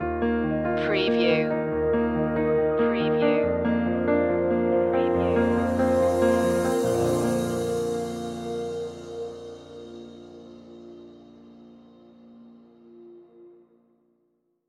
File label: touch the sky stinger